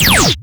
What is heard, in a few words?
Fire Shot 2D, Weapons; Warframe; Bronco Prime